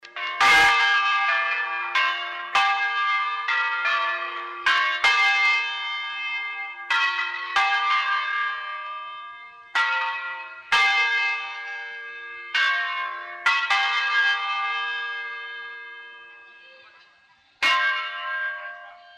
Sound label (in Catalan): campana